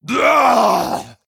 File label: Male Troll Death